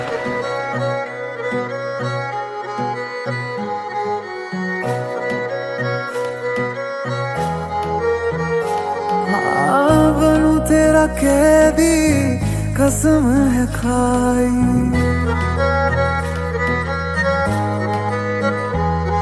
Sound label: bollywood ringtone